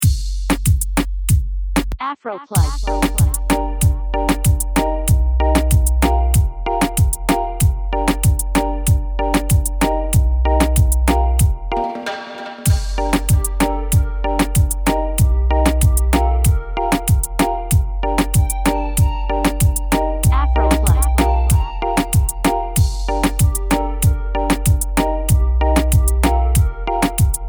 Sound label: Afro Keyz Reggaeton